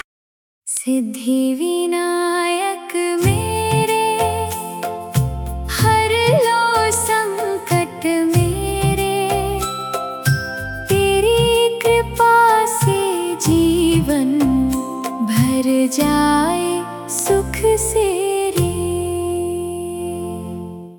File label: Hindi , ringtone; ganesh